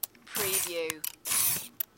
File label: Camera, Motorized Zoom Lens Movements